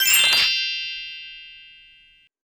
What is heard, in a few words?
bet slip place bet button; netent